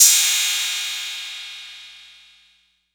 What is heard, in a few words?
CRASH CYM 808; E-MU Formula 4000 Series Vol. 3; Analog Odyssey; Electro Perc